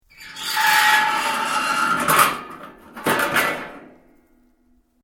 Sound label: METAL SCREECH LONG LOUD; Sound Effects:Materials